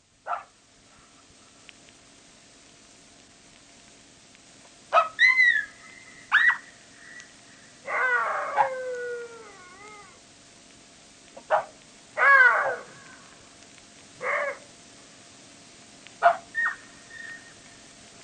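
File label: jackal sounds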